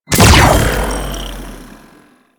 Bubble Shield Activate; Warframe; Captain Vor

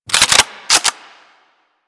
Rifle Reload, Weapons; Drake

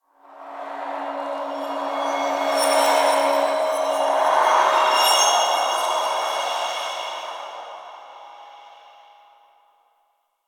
Interior Cave Spirit Gust, Ambience; Warframe; Duviri

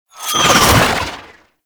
Scaldra Pistol Alt Fire Proj By

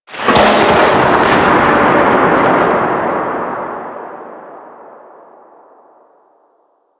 Shipyards Thunder; Warframe; Grineer Shipyards

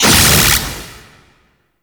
Race Mode Space Mine Beam Start; Warframe; Ambience; Space Battles

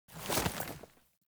Foley Action Default Roll, Default Clothing; Characters; Player

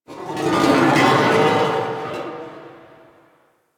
Metal Scrape Amb; Ambience; Warframe; Grineer Galleon

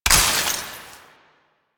Ammo Pickup Toxin; DE; Warframe; Warframes; Frumentarius; AmmoAbility

